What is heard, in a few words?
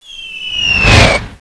bunker buster whistling